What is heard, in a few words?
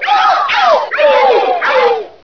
ambient xenome cry